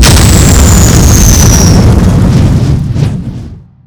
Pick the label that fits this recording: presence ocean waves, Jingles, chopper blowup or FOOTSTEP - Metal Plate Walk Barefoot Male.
chopper blowup